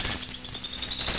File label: Forest Ambience; E.V.O. - Search for Eden